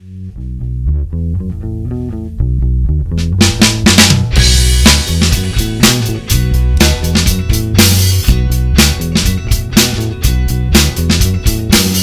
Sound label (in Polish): GITARA